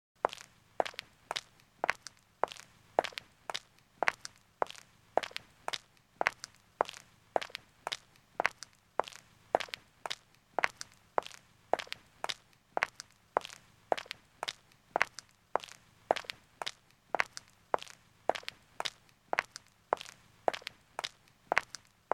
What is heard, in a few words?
footsteps woman country road